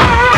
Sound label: solo, stabs